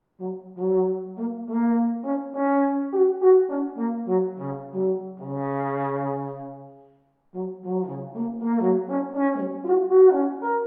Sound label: Alphorn